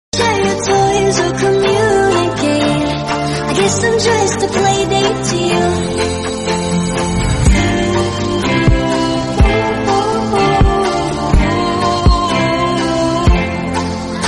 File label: slowed remix